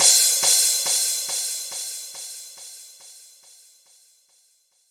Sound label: 140 House Crash; Dance DJ; Drums; Cymbals; Digidesign; Pro Tools; Pro Tools Essential Loops